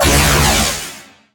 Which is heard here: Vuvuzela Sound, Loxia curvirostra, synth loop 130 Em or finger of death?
finger of death